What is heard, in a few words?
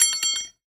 Aluminium Tube Drop